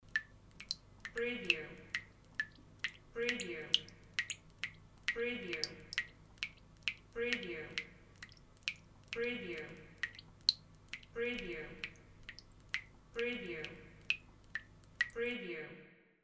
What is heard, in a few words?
faucet leaking